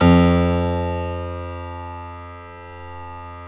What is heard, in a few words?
9FT GRAND; OMI - Universe of Sounds